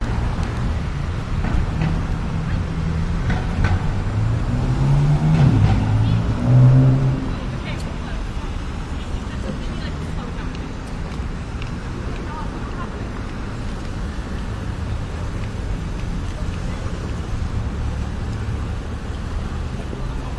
night traffic rumbling roaring traffic cars busses; west hastings st; howe st; your free sounds